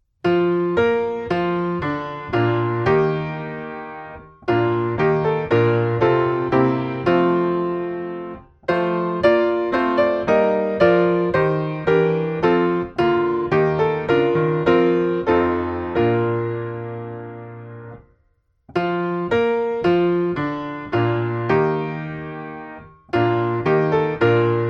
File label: piano; Give To The Winds Thy Fears; Hymns; Sunday Service